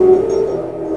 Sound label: FX, Futurist Drum & Bass; Sonic Foundry (Sony Creative Software)